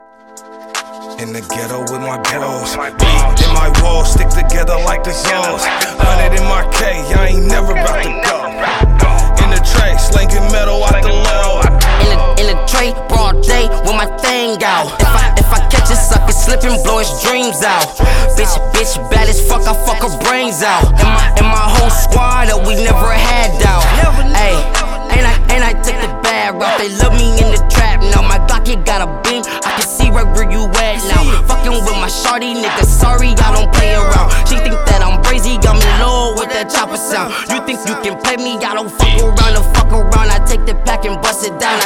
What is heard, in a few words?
Trap